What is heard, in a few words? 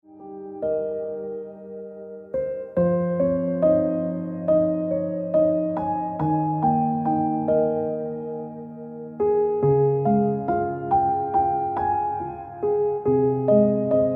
Ringtone Piano Music